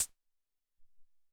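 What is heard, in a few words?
Synth Hat, drums; Alesis SR-18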